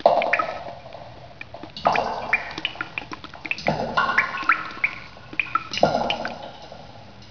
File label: drips; XDM; ambience